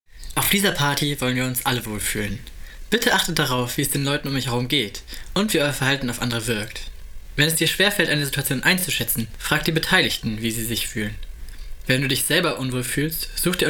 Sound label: Jingle Party